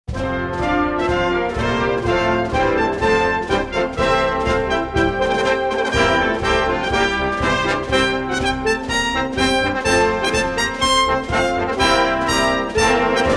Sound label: United Arab Emirates National Anthem